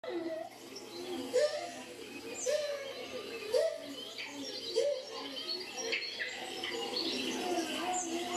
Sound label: Gelada monkey